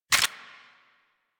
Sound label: Akimbo Pistol Mech; Grineer